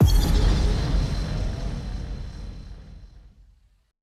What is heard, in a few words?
Sound Title Game Start